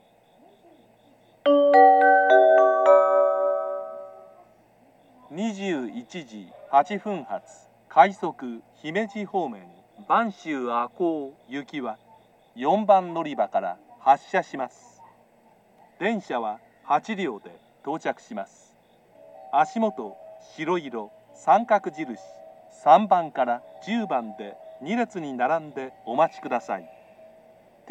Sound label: rapid; banshu-ako; jr-west; ashiya